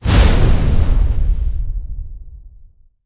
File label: wild Hell Distribute; archangels